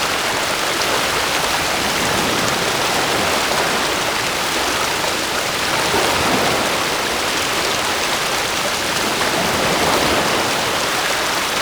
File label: Water Cascade Small Loop; Ambience; Props; Warframe; Duviri